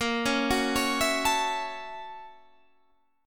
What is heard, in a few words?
Gb 7th Sharp 9th Bb, guitar